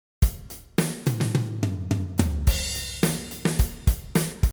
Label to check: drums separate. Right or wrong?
Right